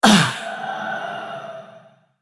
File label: effort; BlackIceAnivia